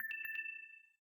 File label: Low Battery In Call; LG; G3-S